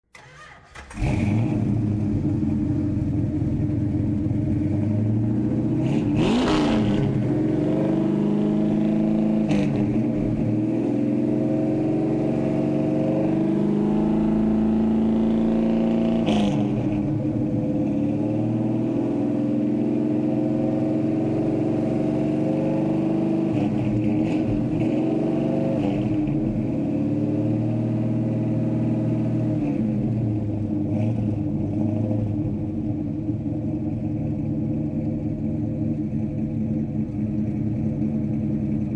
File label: hemi